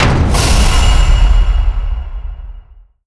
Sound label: pick select, ui menu